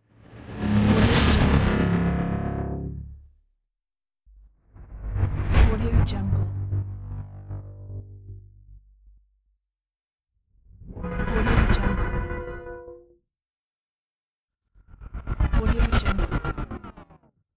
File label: gated scifi